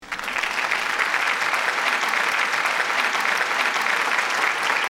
am thanh vo tay trong powerpoint

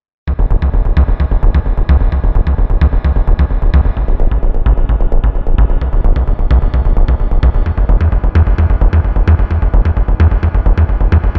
nhac nen phim hanh dong dau sung cang thang

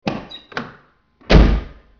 tieng xuong xe o to va dong cua

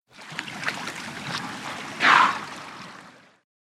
mot con ca heo nha bong bong tu lo phun nuoc nam tren dau no

